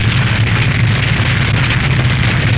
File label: fire loop; tanarus